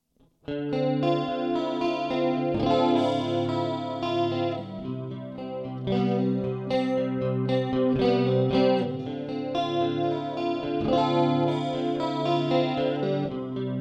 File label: Clean Chorus